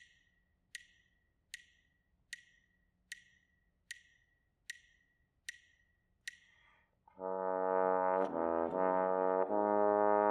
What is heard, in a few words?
melodic dictation; eartraining